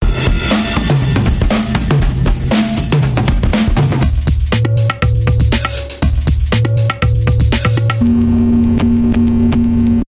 Static Groove Dance Bumper Reversed

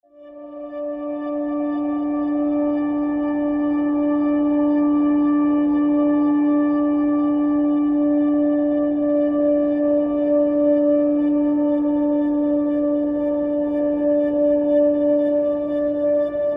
Quiet Layer

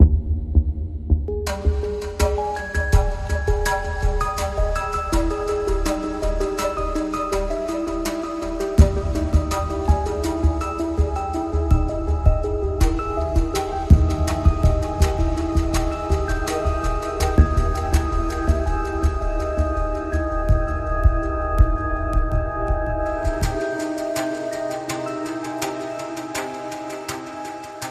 Flute Dance

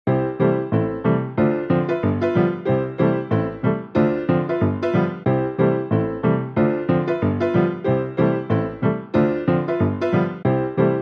Piano and Brass Only

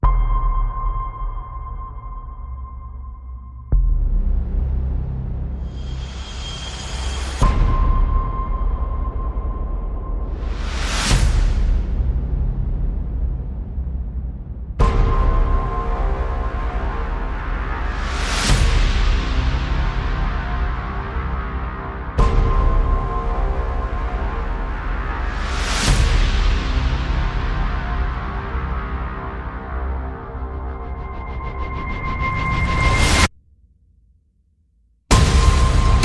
Blinding Drums Full

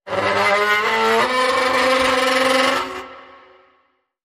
Bigfoot Growl

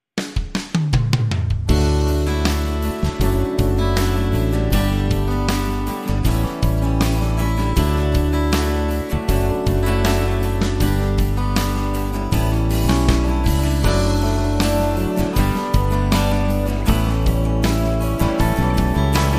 No Lead Guitars

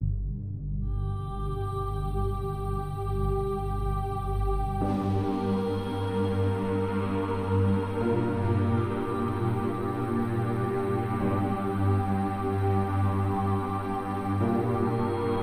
Drone, Light

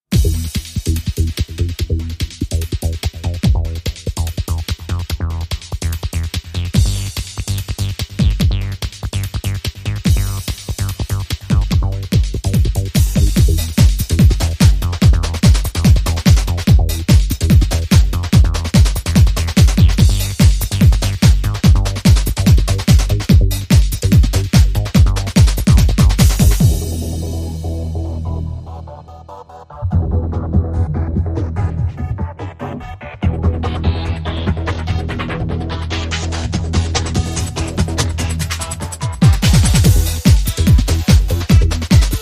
Check The Alarm, Rhythm

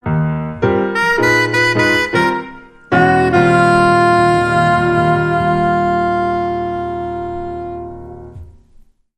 Ragtime, Sting

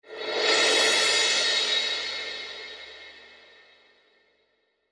Quick Roll

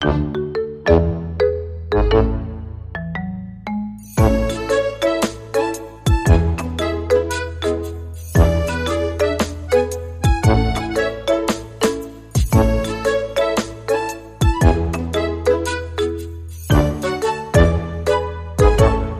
RnB Drums

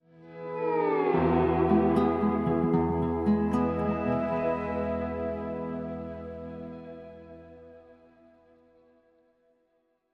Sting 1 Acoustic Ver

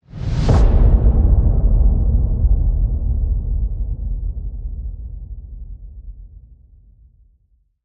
Fast Whoosh Boom

